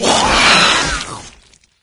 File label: death; doom3